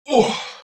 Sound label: death